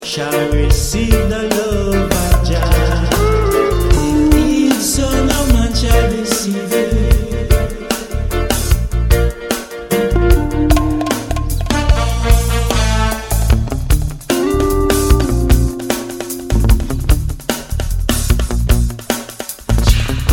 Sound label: jah dub